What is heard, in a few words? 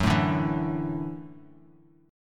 Gb Minor 7th Sharp 5th, Bass Standard; strummed; JGuitar